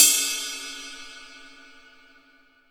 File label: Cymbals